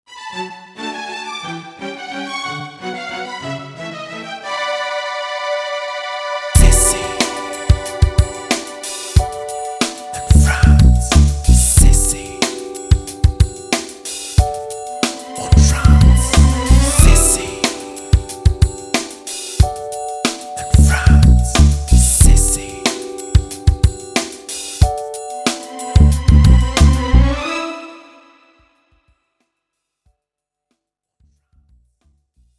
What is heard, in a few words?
jingle